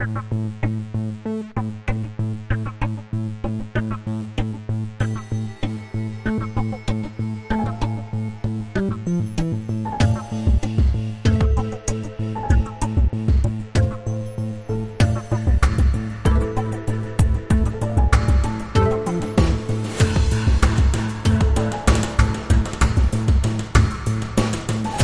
electro

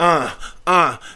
Rap Vox

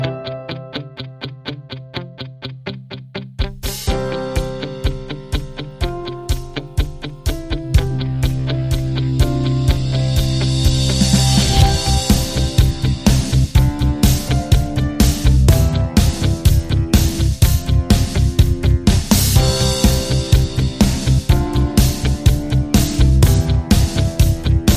Business Pop Rock

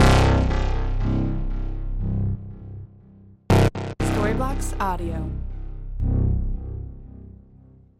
Ambient House Bass 120BPM C

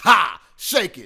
Rap Vox

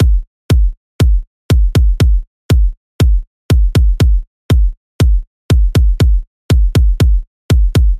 Kick 120 C; Beach Day

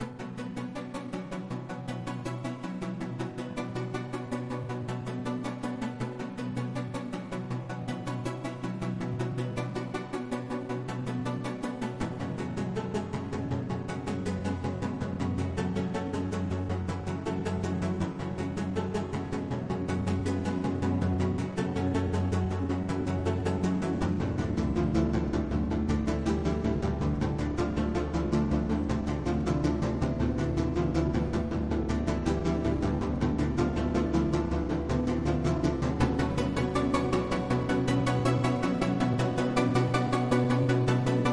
Rhythm and Melody Elements 90 bpm melody

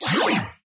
digital warble

